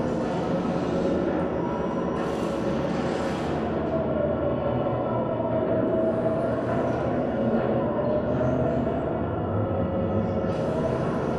Robot Arm Track Fast Dist; Boomtown; Titanfall 2

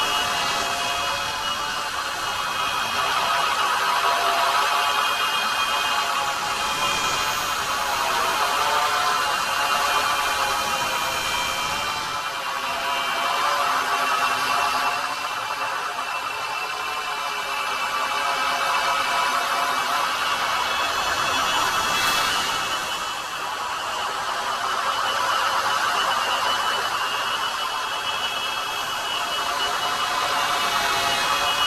Drone Hover Close; Titanfall 2

